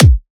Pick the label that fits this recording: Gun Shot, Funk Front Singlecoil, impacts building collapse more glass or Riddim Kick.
Riddim Kick